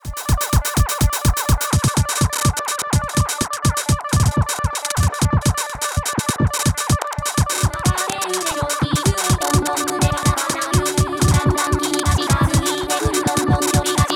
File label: RINGTONE